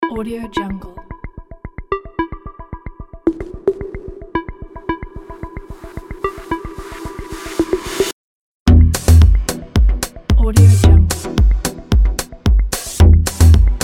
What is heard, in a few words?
cold minimal fashion show